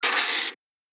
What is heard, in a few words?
Pencil On Paper; Writing Sound Effects; Foley Sound Effect; Andrew Fleming